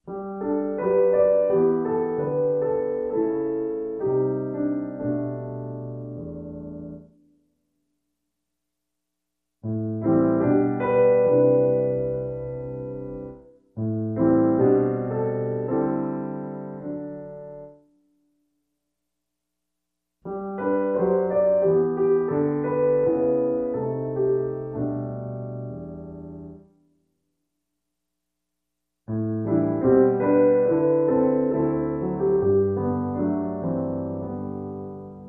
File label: Underscore